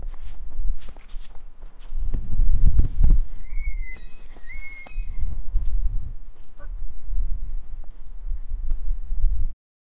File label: parkour vaulting